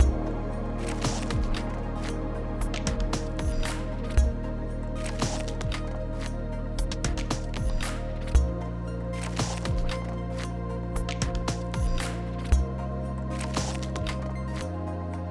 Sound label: Puzzle 57 5BPM